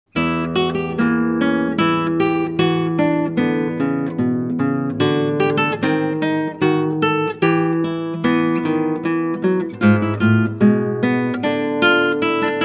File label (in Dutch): Klassieke Gitaar; Bach 50 Solos